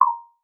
UI Defeat

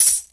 event key

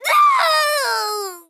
BOSS Woman Death

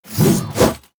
BOSS Atk

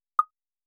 UI Open Second

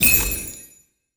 UI Fighting Up

